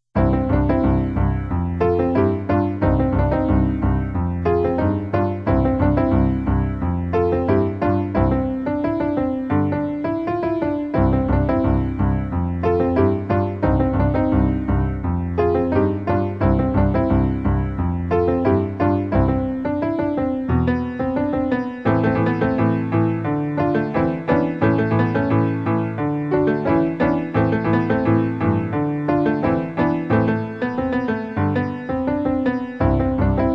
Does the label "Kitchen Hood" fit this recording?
No